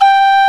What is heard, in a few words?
PENNY WHIST; Penny Whistle